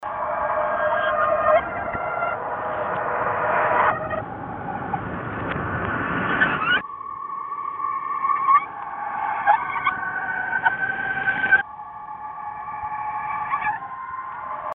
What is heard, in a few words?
reverse fx atmo